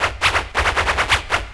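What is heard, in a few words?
scratch, record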